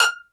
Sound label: PIZZ CELLO F5 L; Greytsounds VOL-1; STEREO PIZZ2